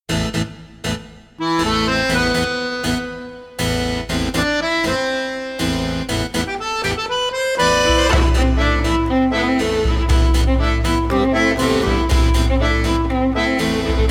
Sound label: sicut lupi strumentale